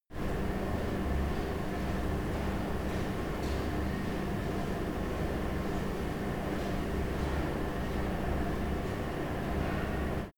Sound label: machine room spinning buzz